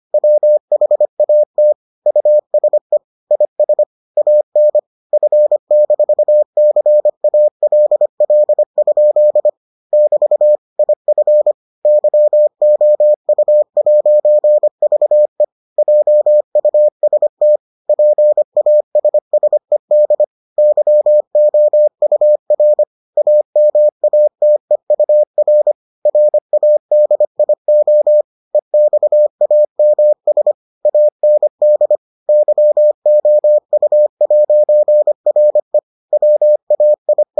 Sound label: morse